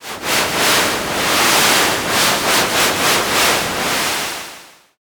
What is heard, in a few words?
river